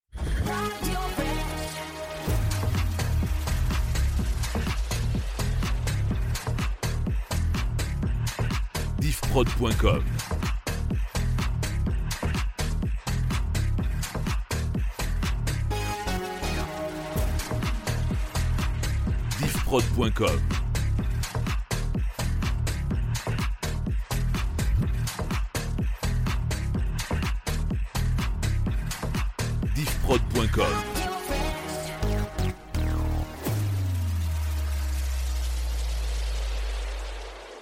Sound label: Phonk 125bpm backing vocals; Rampe Phonk2 125bpm